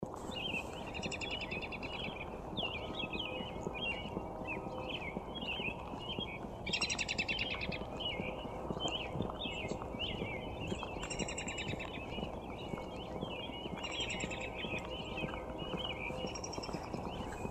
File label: Birds mix